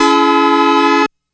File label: C Minor Obi One Shot, synth; Sonic Foundry (Sony Creative Software); Electronic Point Blank